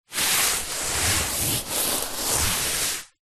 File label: sport exercise mat foam hand rub